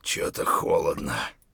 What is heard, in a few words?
bleed in; Escape from Tarkov